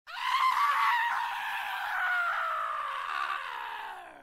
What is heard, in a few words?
man screaming